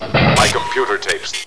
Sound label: bump; quake2; robot